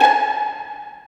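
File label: Violins Pizz; Roland L-CD702; Vls Pizz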